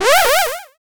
4 bit, games

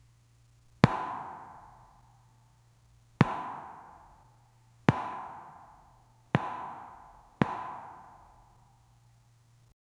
clean pop of a tennis

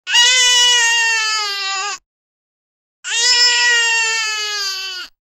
monkey crying

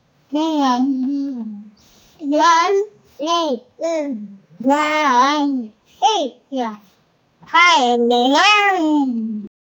happy child

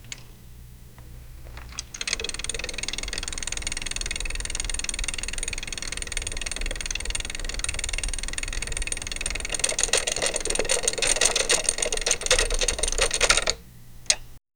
gears churning; automation

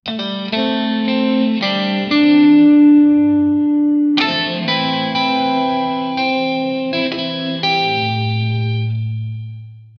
educational intro music

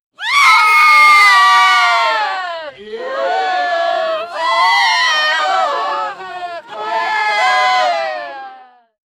a group of all female, sea; sound effect

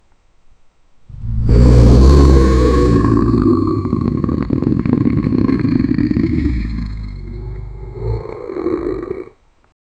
a giant monster stands, ruined

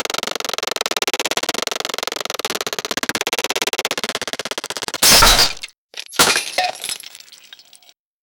cartoon ice platform brea

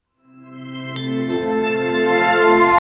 celestial intro serene

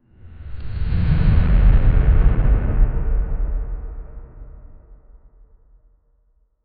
epic giant gorilla roar; ape

